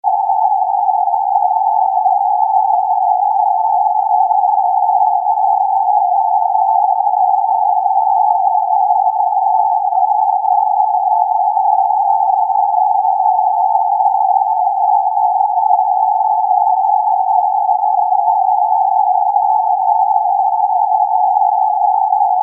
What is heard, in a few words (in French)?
bruit rose